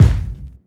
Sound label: fluffy bass drum single hit g sharp key